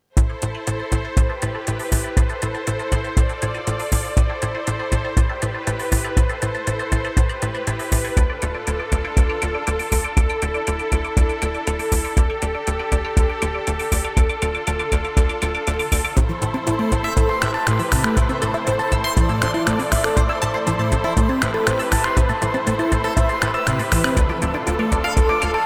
Synth Festspielhaus Salzburg and Steinhofkirche Vienna; VSL MIRPro3D